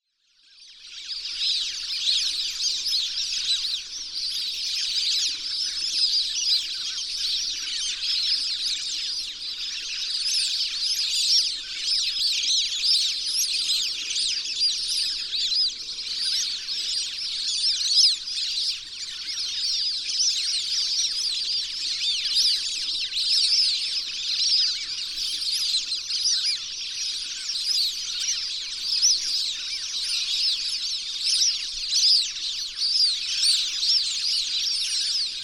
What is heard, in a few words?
Plumed Whistling Duck